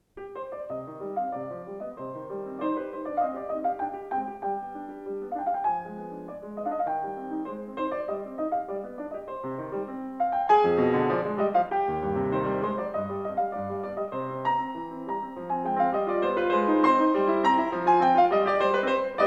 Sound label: Piano Sonata No. 8 in C minor; Wilhelm Kempff; Beethoven; Piano Sonatas